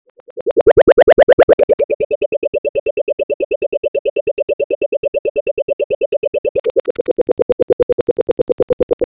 a faint boing like sound; repeatable

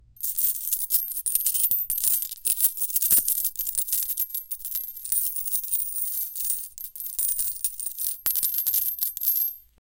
a chest opening followed; gold coins

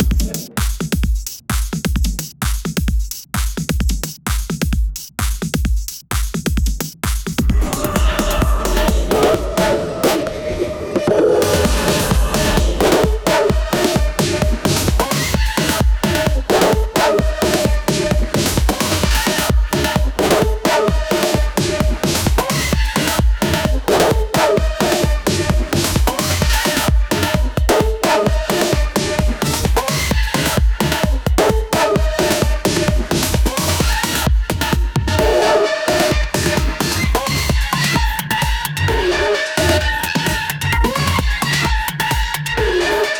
disco

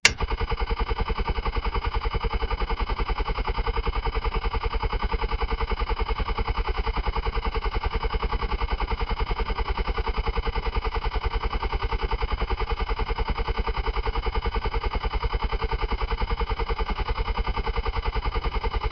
car engine sound

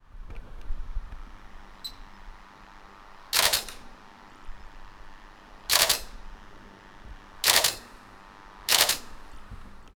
camera shutters outside; photography

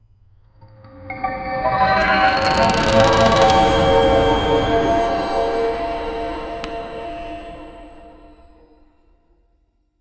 imagine enchanted paint, artifact

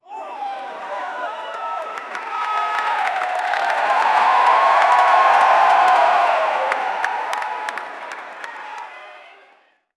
videogame soccer game che

